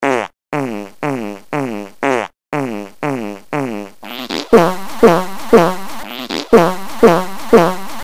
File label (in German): heiss feuchte luft klick, click